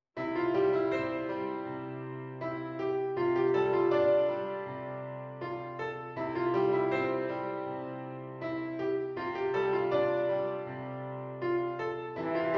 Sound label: Hrn, french horn